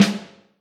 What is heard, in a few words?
Vintage Snare, Snare Drum; Q Up Arts; Johnny.C's Vintage Snare Drum; 6X14